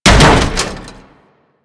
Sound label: Moa Body Fall; Warframe; Body Falls